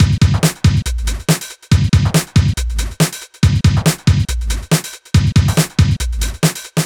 Loops BB 140, BreakBeats; Vengeance Samples

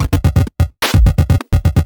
VFX2 Loop 128 BPM

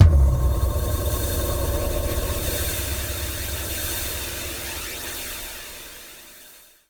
Hallkick; Vengeance Samples; VEH1 FX

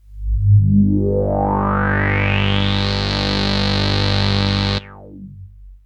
Arp C G1, ARP Odyssey; synth; musicradar-synth-samples

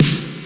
Snares; Vengeance Samples

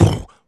Beatbox Kick, Human Beatbox

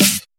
snares; Vengeance Samples

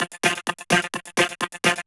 Vocoder Loops 128BPM

